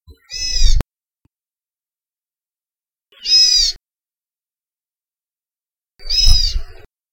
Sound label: amakihi